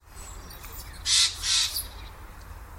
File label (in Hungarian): madárhangok szajkó Garrulus glandarius